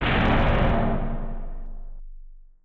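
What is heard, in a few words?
Collision Interior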